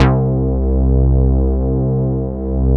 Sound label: SYN ANALOG; Sound & Vision - Gigapack I CD 2 (Roland)